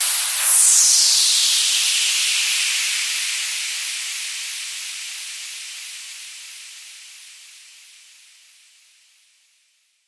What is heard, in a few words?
Impact; 7rystan's 600+ FREE EDM SAMPLES & DRUMS; SFX